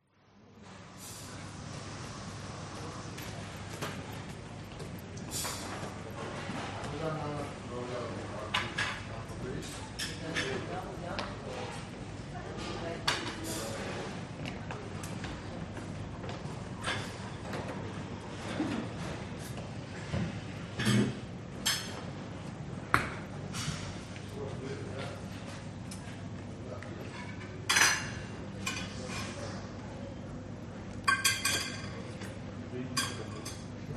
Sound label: cafe airport quiet time plates cutlery people coffee machine qatar